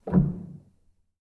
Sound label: rel, Grand